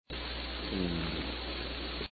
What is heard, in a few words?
fish groan; salish sea data examples; signals